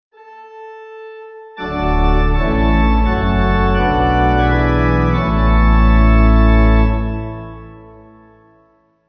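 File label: Pipe, Pipe Organ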